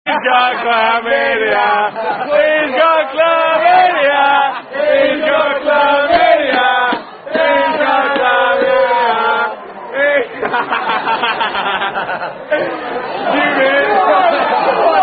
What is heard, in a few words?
Rude Football Chant; Very Funny Football Chants